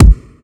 drum hit normal; hitsounds; Azer+ 2023

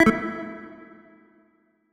check off; Silver Horizons; seysant

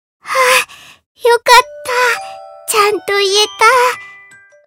applause; RubyKurosawa

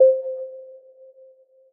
pause back click

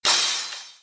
combo break; Aristia rgby

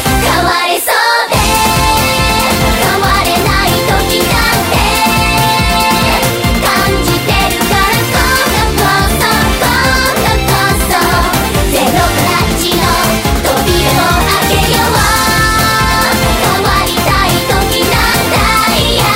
applause

